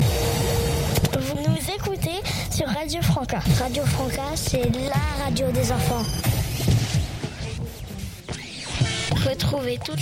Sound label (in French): chant ce1